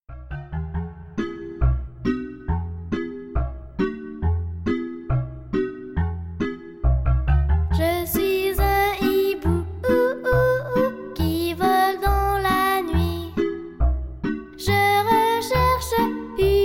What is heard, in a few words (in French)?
melodie